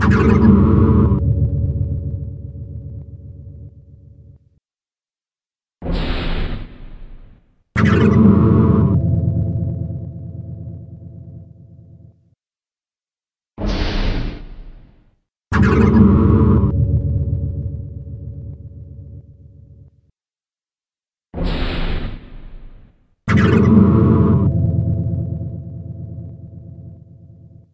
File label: Tower Ambience; Aidyn Chronicles The First Mage